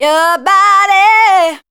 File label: voice spectral, ly short